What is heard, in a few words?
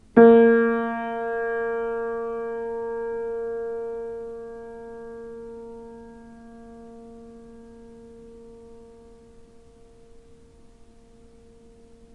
piano